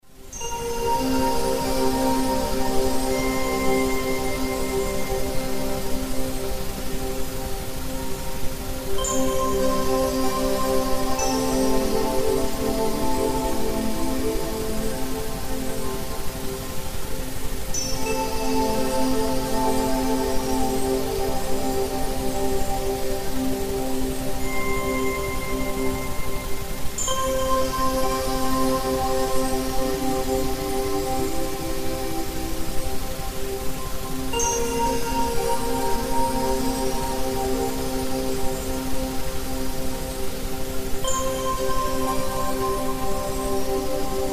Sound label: Relaxation Music with Tones; good sleep; Sublim Demo